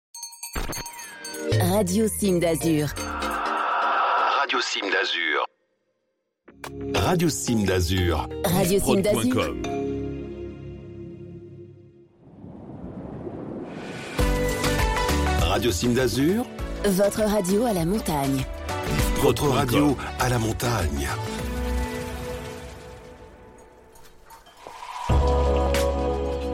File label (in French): Sweeps Radio de Montagne; Radio Cimes d'Azur